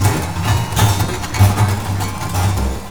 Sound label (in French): charivari